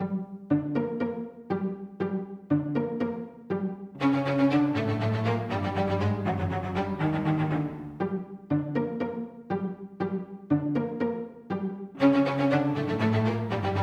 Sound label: Mysterious; Pbff Music#49 (Bgm Str 04 Mysterious)